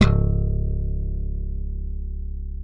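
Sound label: left thumb C#1, slap thumb; Xsample VOL-1; 90 s Sample CDs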